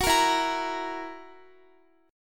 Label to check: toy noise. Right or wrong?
Wrong